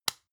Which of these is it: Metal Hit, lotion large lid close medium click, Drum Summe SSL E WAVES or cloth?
lotion large lid close medium click